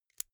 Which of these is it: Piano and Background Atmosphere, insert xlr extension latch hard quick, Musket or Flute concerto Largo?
insert xlr extension latch hard quick